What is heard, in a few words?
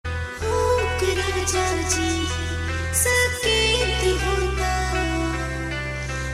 msg 3d; blues